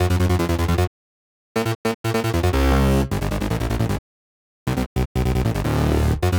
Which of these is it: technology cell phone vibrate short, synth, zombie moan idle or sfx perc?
synth